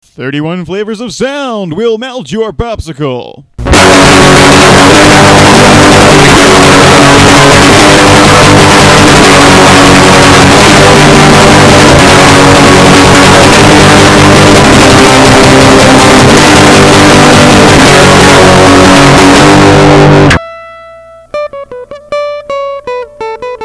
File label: acoustic